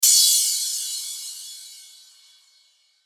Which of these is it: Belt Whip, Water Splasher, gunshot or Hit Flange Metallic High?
Hit Flange Metallic High